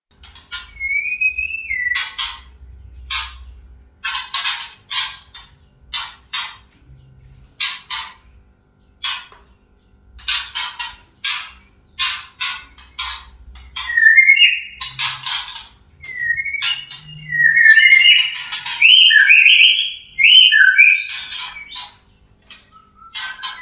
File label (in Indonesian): suara murai betina